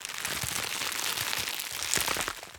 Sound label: Ice Frost Spell Skill